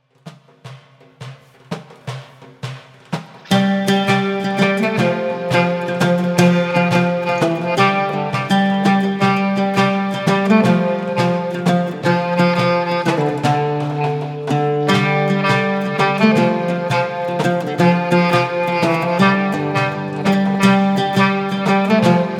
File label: world music; suoni